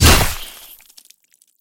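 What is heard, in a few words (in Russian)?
массивный удар мечом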